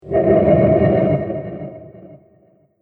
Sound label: Motion In The Dungeon; Scary Soundscape Sound Effects